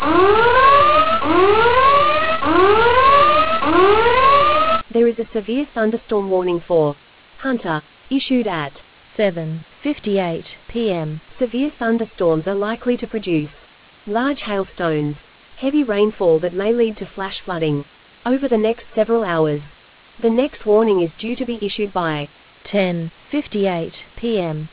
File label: storm audio